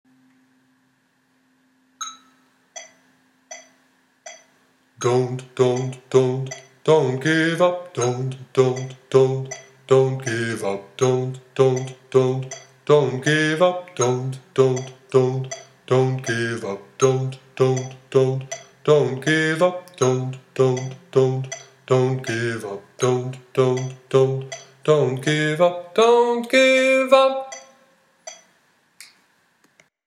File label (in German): Endteil Bass